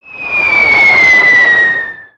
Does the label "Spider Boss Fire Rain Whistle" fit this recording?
Yes